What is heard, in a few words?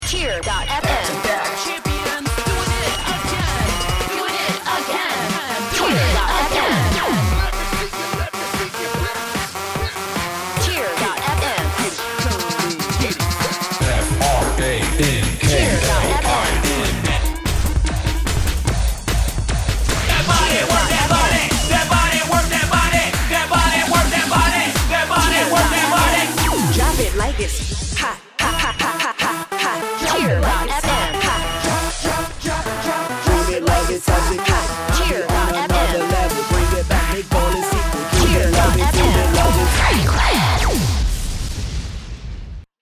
dance w loop